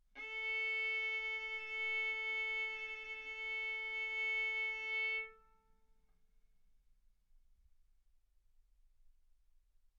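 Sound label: Violin arco mf A string A4 B4 stereo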